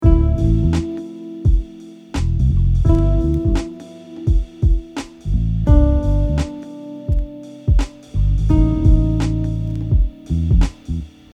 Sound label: Voice leading example, Chord Tone Voice Leading example 3 extensions, guitar